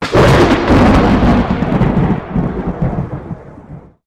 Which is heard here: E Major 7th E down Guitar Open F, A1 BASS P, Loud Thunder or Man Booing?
Loud Thunder